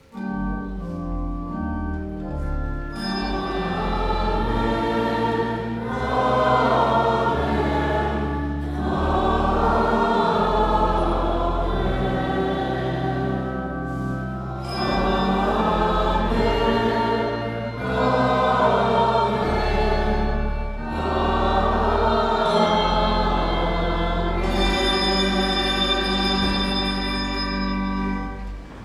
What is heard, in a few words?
Amen; StJ bells